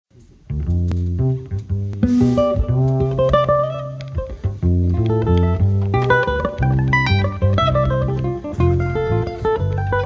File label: ashbory